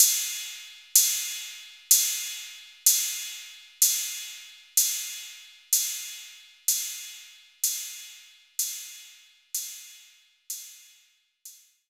TR 808 Cymbal

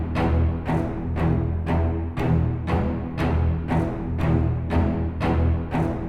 String; The Bureau XCOM Declassified

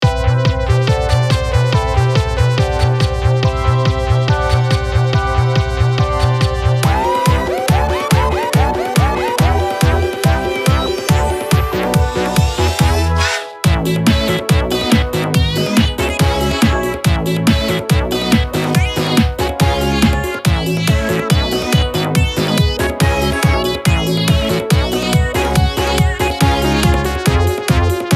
HOUSE

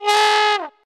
Fail; benjamin bluemchen ein tag im zoo; nds gamerip

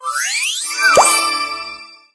Sugar Drops Spawn; Candy Crush Saga

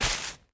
Grass

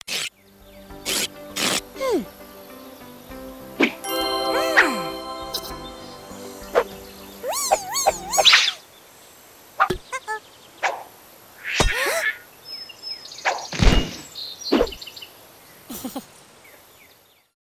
Greek Gold; Worms Forts: Under Siege

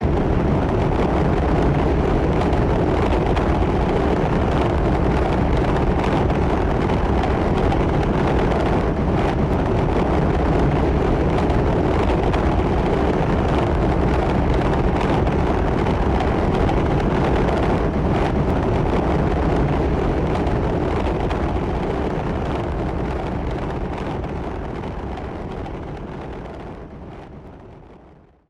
ambient brontide

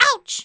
HIT; FRANKLIN

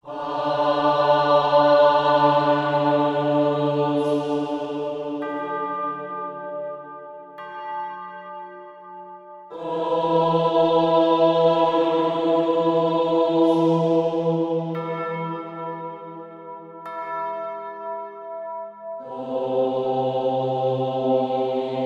Sacred Chant Mixed With Bells

